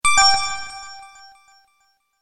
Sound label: match leave; megamix